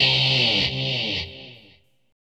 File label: GUITAR, ROCK POP; MAGIX